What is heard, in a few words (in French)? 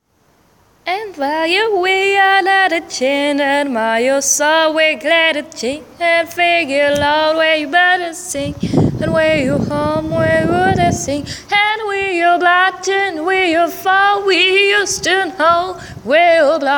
vocal